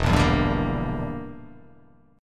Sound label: E Minor 7th Flat 5th E